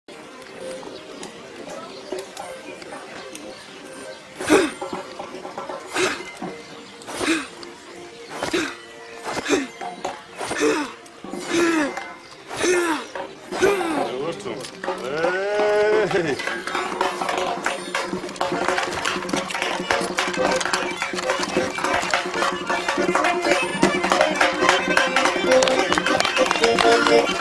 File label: chechen lezginka